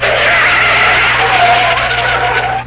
skiding; Epic Interactive Encyclopedia

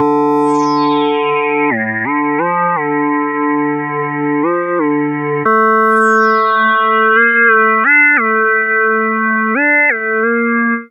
Synth Lead; maudio; Hella Bumps

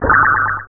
Spell Effect Heal; Realmz 4.2.2

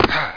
jump

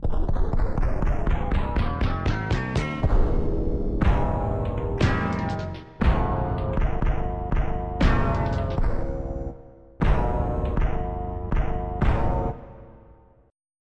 Guit n Hat; Onj's Soundfont

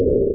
CREAK

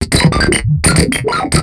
robot chatter; Earth and Beyond; EA Games

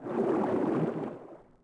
tread; DAoC; Toa; Gamestar

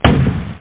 grenade; t2029

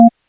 A#3, Synth Arp; GrooveMaker

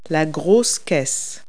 bass drum; Multi-Lingual Talking Picture Dictionary

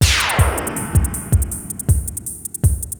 DRUM LOOP ambient 160

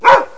dog awakens; WormWars

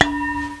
cane; Little Red Riding Hood

